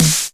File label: Snare; VEC1